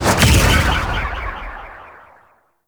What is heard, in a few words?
fire spear slam; Warframe; Nezha